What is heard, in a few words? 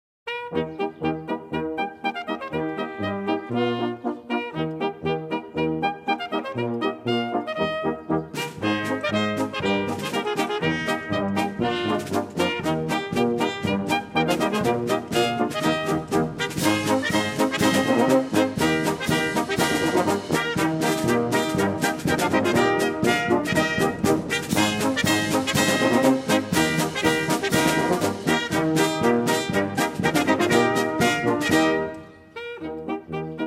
Drum & Bugle